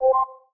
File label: msg notify